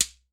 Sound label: SHEKERE, Latin Hand Perc; E-MU Producer Series Vol. 2; More Studio Essentials; Composer